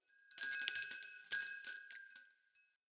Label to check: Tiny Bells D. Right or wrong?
Right